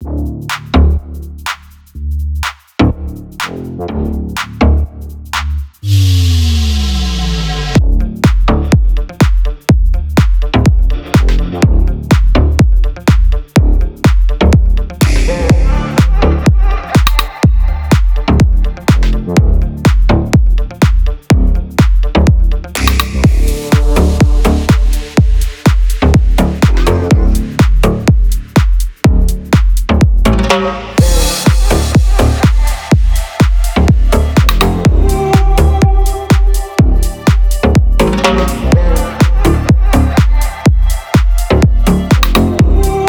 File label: deephouse drums; by burak sound bank; deep house vol 2